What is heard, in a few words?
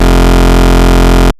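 bass sustain F; Polyend; ARC Noise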